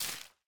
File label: Leaf litter place; Ściółka